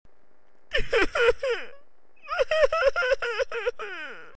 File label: crying; geisha; skaapsteker